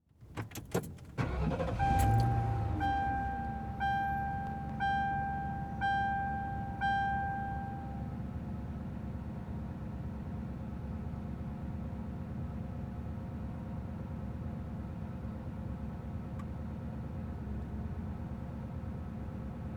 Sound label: Auto Police Car Start Idle Off Interior; Transportation